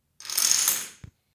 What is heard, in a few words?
Dominos